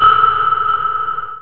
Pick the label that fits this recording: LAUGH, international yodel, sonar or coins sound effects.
sonar